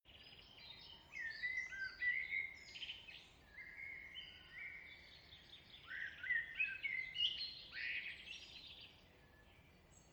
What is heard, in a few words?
Nature Birds Evening UK Countryside Song Birds Distant Sheep Pheasant Woodland; Environmental